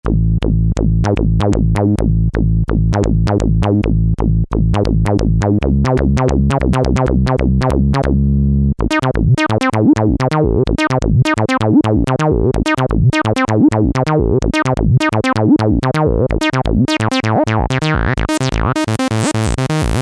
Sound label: Tee Vee Saw